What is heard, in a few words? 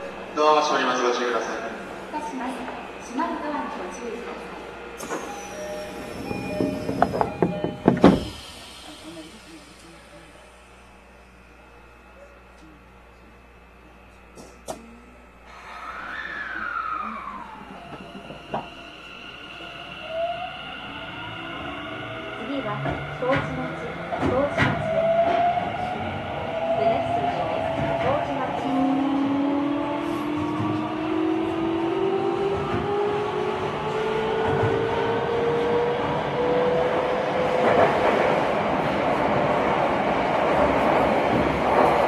vvvf, mitsubishi; tokyo10807b